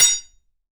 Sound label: IRONWARE, IRONWARE HIT; AKAI S-Series CD-ROM Sound Library VOL-6